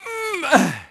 EFFORT; VOICE; PHANTOM; Pulse Racer

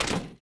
fs metal, droids robots

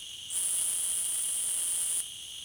crickets; Soldier Of Fortune II Double Helix; XBOX; ambience

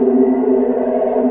Caught Loop, Gun; Watcher Gun

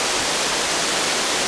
waterfall; Soultree

